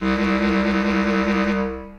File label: Bass Clarinet Solo Half Step Trills, Orchestral Woodwinds; Ableton